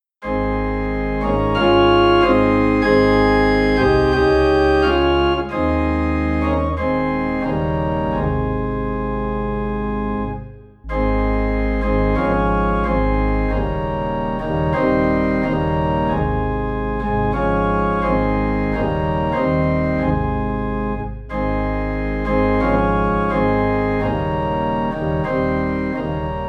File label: Organ Low in A Flat; I Surrender All